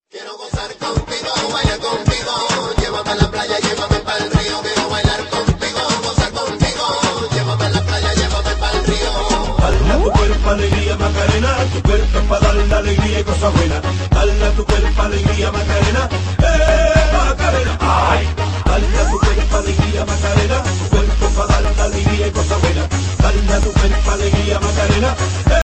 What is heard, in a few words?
ringtone